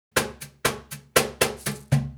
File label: Cajun, Percussion, Drums; Essential Percussion